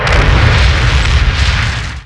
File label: Collision, Explosion